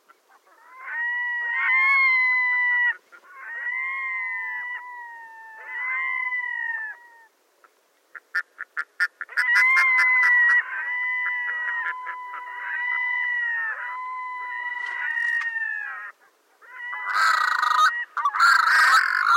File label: red-throated diver; SMAALOM